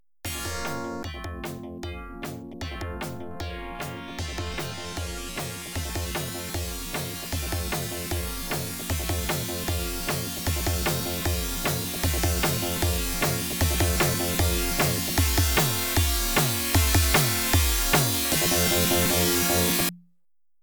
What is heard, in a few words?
Catwalk Timer, pinball